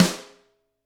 GRETSCH SNR rim; ILIO; Double Platinum Drums 1

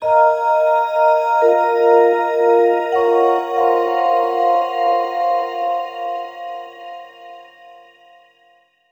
Vibe Bell Drift; Sonic Foundry (Sony Creative Software); James Johnson Spektral Minimalism; Sublime Keys

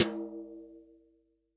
TOM, Real Drum Kit, SWING KIT

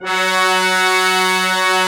BRS SECMT, Ensembl.mute; Roland L-CDX-03 Disk 2

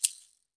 SHAKER, Heart of Africa; Spectrasonics

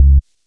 Syn Bass; Equipped Music

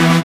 SYN DANCE; Sound & Vision - Gigapack II

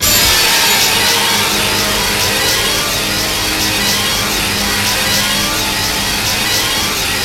G#3 ORCHE; Northstar; The Wizard VOL-1; ORCHESPHERE 1

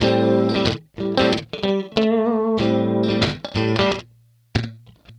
Electric Guitar

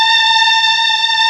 STR A#4

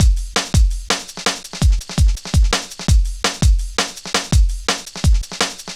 Drum Loops, Jungle, 166.5

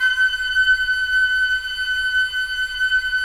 Flute, Roland LA Composer; Roland - LA Composer vol. 4; 90 s Sample CDs

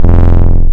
Digital Resonator, wavetables; Animoog

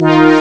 brass accord; Amiga Soundtracker Sample Packs

